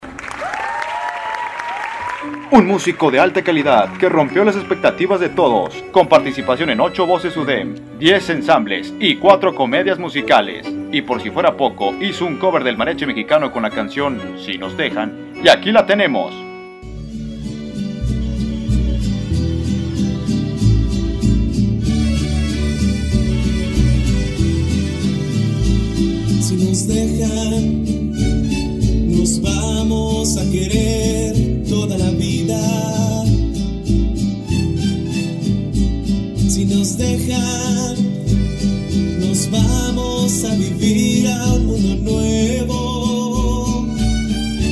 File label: One Man Mariachi